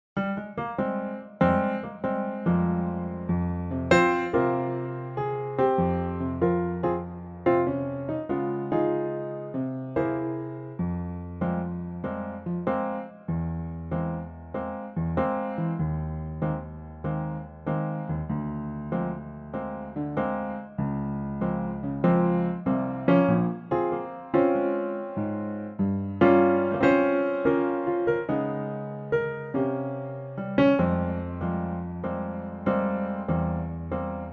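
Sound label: Fm swing piano, male; key - Fm - vocal range - F to Ab; swing Pianotrak; Lightly swinging Pianotrak of this wonderful song.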